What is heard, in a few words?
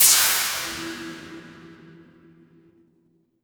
magic scifi laser